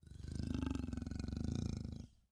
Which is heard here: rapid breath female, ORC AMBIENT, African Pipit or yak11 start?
ORC AMBIENT